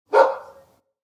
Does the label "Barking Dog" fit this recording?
Yes